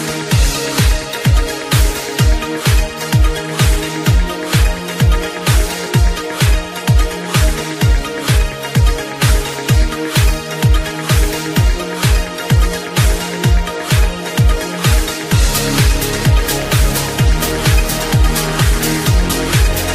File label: Pianophonic